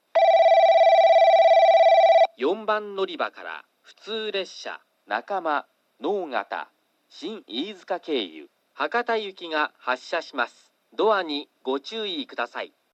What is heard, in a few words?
local Hakata; 4番のりば発車放送（普通・福北ゆたか線経由 博多行き）; kurosaki